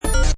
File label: Hi Tech Panel Servo Button